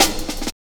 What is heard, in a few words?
Pride Cut, Drum Loops, 165.5, Jungle; Zero-G Jungle Warfare 1, 2 & 3